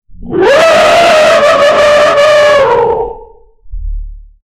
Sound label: an accurate tyrannosaurus; tyrannosaurus rex